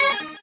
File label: normal hit normal